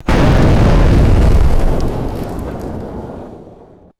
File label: medium explosion detonation with falling debris; explosions